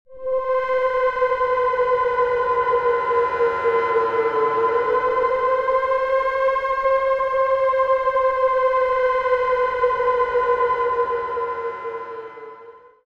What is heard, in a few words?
toms